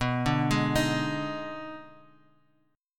Eb Major 7th Suspended 4th Sharp 5th B

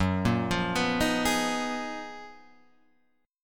F sharp Major 13th Guitar Half Step Down

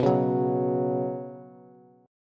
B Suspended 2nd B down Bass, Bsus2 chord; strummed; JGuitar

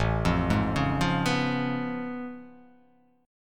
A Augmented Major 9th A down

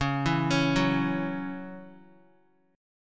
Db Minor Double Flat 5th Db Guitar; arpeggiated; JGuitar

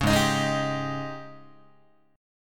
G sharp Major 7th Suspended 4th, Guitar; G#M7sus4 strummed; JGuitar

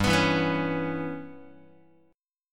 G 6th Flat 5th, Guitar; G6b5 strummed; JGuitar

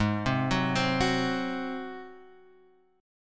Ab 7th Ab down; Ab7 arpeggiated; JGuitar

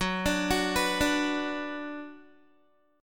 Gb Suspended 4th Sharp 5th, Guitar; arpeggiated

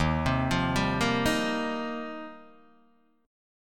D sharp 6th Add 9th, Guitar, Open D

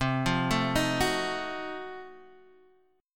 F 7th Flat 9th C

